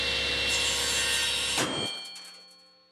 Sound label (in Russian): pila zvonkii rezkii shumnii